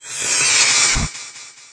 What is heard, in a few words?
quad damage